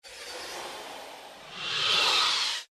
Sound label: power down; Motorola VA76r Tundra AT&T; ui sounds